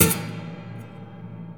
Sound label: bash, Cinematic Metal Impacts